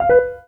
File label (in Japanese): ブザー; ブザー2; LcdDem SFX